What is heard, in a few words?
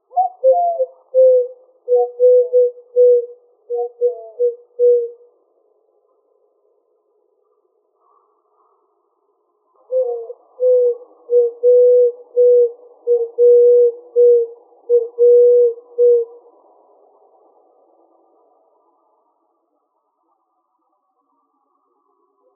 Doves; AZFO; deviche